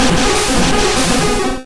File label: Hydro Pump Single; sfx; attack moves; pokemon; gen 1; rby